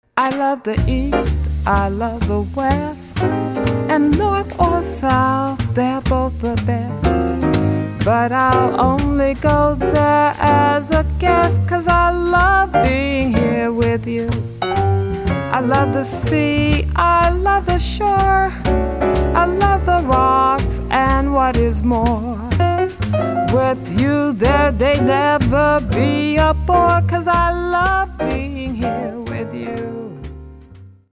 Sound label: Armonica